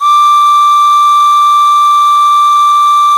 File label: SML DANCE, American Natives, Earth Tones; E-MU Formula 4000 Series Vol. 4